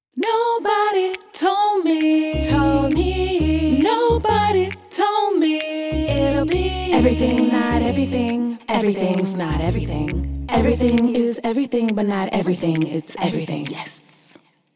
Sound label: intro outro song